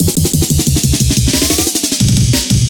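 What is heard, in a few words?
Glitch pitch amen break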